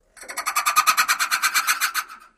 Bat Call Scream Laugh

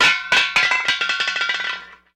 Hubcap On Asphalt

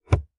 vent lever; dashboard accessories

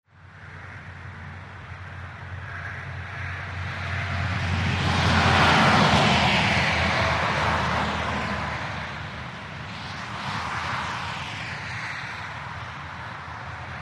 Traffic Busy Highwy; highway

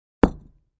Patio Block Impact, concrete & brick

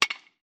Aluminum Bat, baseball; baseball fx & games

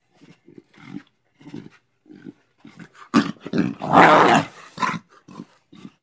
Lion Cubs Playfighti; big cats

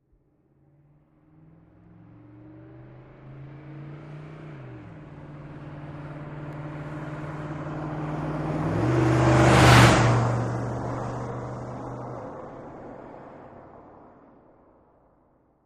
Humvee Fast By

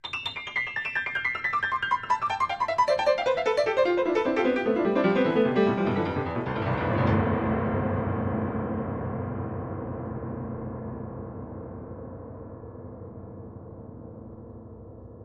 Grn Piano Funny Lng De, piano

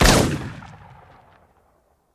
Gun Shot Single Silenced

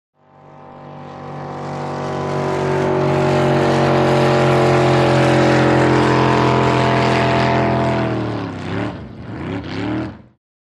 Drag Boat By Slow

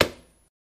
Ice Crushing With Wood Mallet

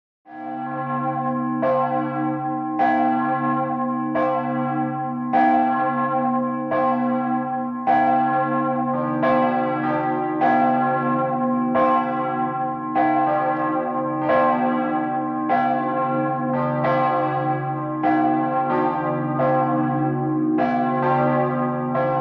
Church St Peters Prag, bell towers

